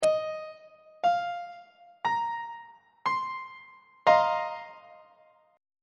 e sharp dominant 7th suspended 4th 3rd inversion on treble clef